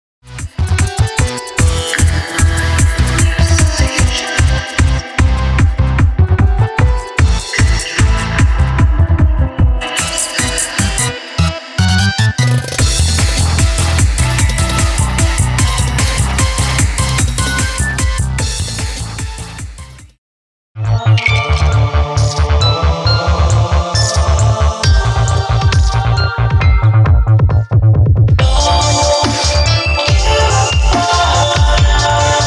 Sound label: trance